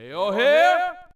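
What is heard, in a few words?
yell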